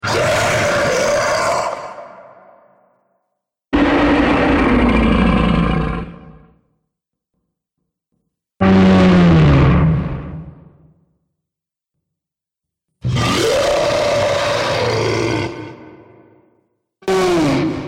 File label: Scream Huge Monster Soundpack